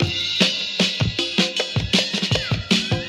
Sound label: Drum loops, Funky